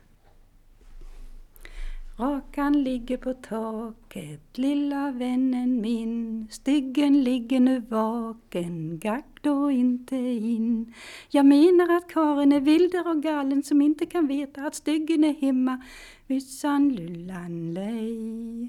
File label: Folk music of Småland; Rakan ligger på taket